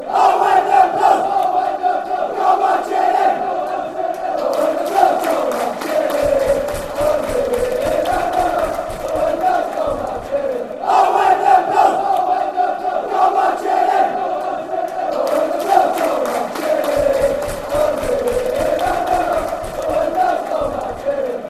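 blues go marching, football songs and soccer chants; hufc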